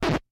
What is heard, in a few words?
record scratch